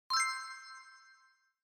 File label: Notification Sound